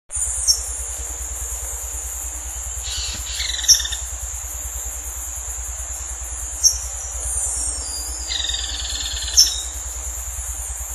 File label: Melanerpes flavifrons; PP Mocona